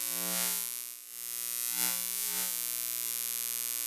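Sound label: ambient electric buzz loop; Half Life Model Pack; Half Life Alyx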